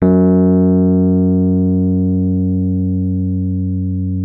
Rhodes F#2; Xsample VOL-1; 90 s Sample CDs

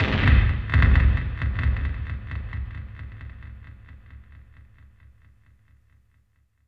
NASTY BDRUMS; Zero G; Malice In Wonderland

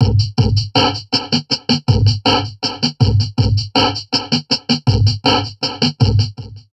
Drum Loops Crazy Processed

